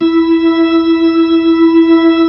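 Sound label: B-3, Hammond B-3 organ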